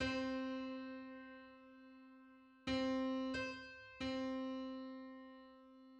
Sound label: Five-hundred-seventh harmonic on C